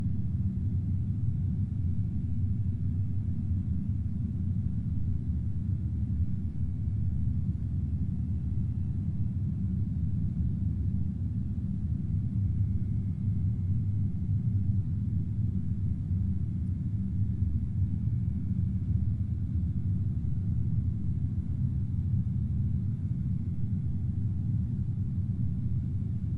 Hotel Ambience

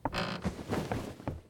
Bed Enter

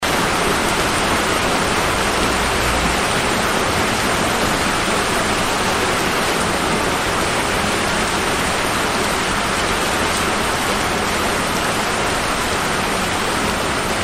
Hotel Ambience

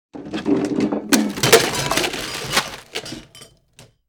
Figure Break

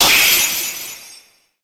Chain Break

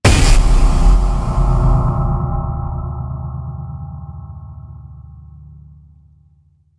Heartbeat Messup; Plays after mistiming a heartbeat.

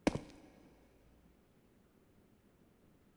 Footstep Brick

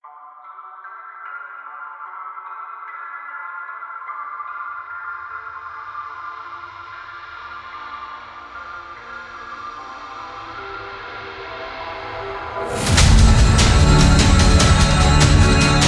Trailer Theme Extended; DOORS

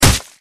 Damage Noise

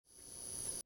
Bulb Charge

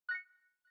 Found Small Loot

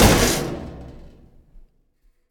generator slam

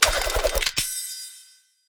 Gold blaster equipped

